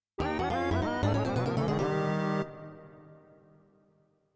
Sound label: CM32L